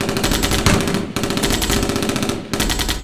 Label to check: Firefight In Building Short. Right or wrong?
Right